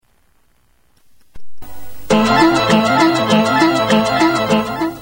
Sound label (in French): jingle meteo